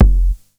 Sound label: Kick Blunt